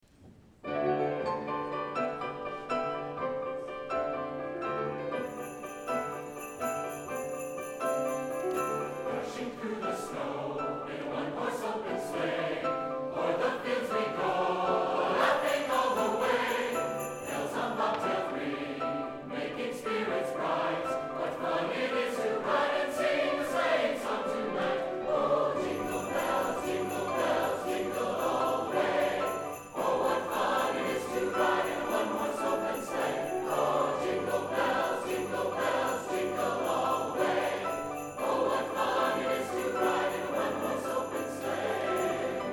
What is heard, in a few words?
SATB